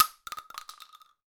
Casing Shotgun Shells 2x Hard Surface; The Looker

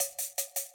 popunk Speed Shaker; hot lava; ios macos windows; Molten; Verse Pre Chorus

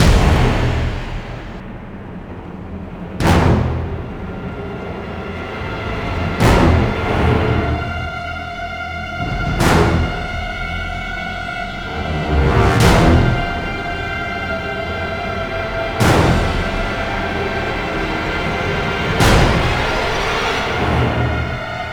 Suspense; Prototype

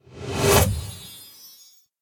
hud dj

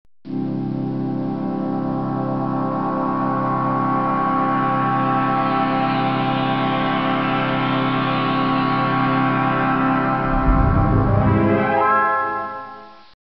Logo; Brøderbund

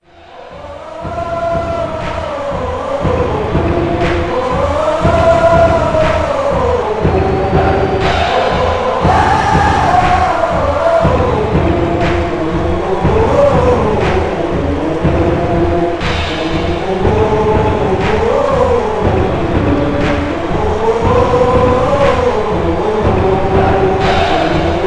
Chants, Danny

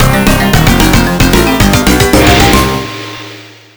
Beat Boss; American Dragon Jake Long Rise of the Huntsclan; GBA

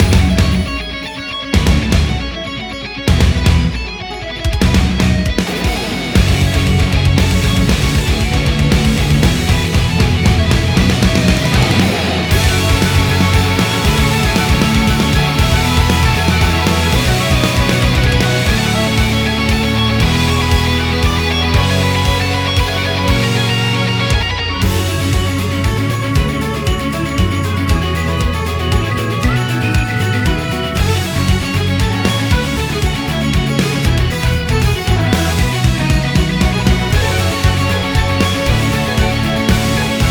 Nest Dungeon treasure battle; vivid knight switch windows gamerip 2021

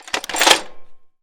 Gun Machinegun Auto Heavy Reload; jasem just another shooter with electronic music windows 2017

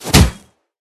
Mega Knight Attack; Linirea

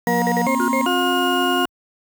Round Clear Music

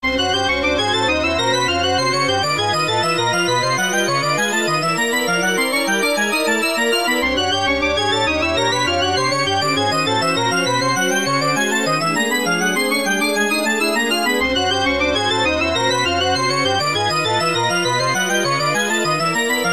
Looping Steps; B3313; n64

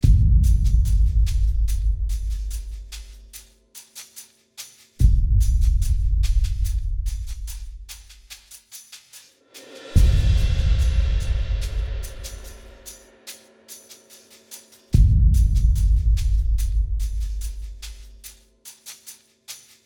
Anticipation Loop

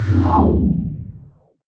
floor close; Tds Sfx Floorclose

